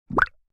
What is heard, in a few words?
Water bubble pop